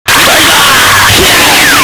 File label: animatronic jumpscare; kamen rider ichigo